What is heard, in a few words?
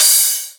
Hihat Open; osu